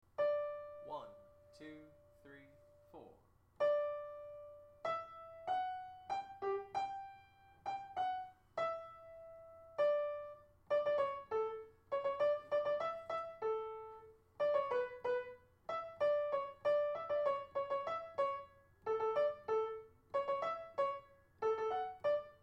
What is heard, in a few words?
Hall Sop, hallelujah chorus